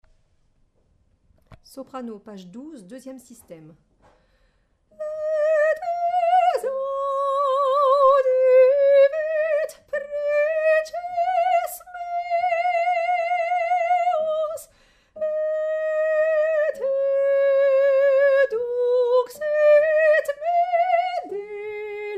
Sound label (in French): psaume Soprano